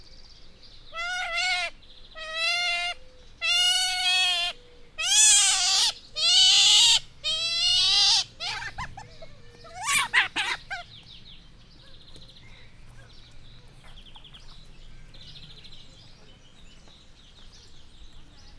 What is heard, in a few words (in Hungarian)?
ételért kuncsorog, ázsiai kiskarmú vidra; jászberényi zoo 2015